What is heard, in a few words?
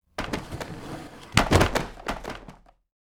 Falling Books; Lucius III